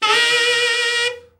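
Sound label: TENOR overblow, Sax, FALL; Giga Samples Collection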